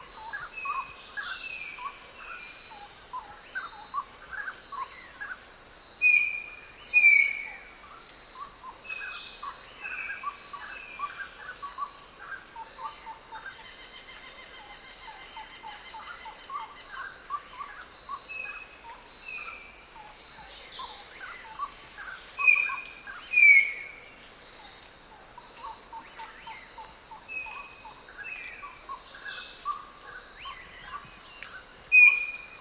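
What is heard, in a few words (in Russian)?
истеричные звуки птичьей суеты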